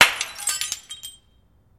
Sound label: Light Bulb Break; lightbulbs